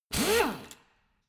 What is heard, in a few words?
impactor short soft; maschinen; bohrer